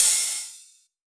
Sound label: Rides, Cymbals